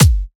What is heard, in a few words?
Kick, dubstep; Ghosthack - AC2024 - Day 13 - Dubstep Sounds 2025; Frost Kick